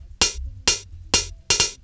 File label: hihat; Computer Buyer 1997 April